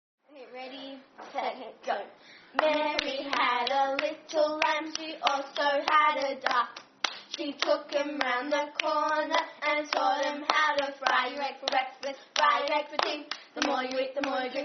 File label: Clapping, Teasing Rhyme; Mary Had A Little Lamb (Teasing Rhyme)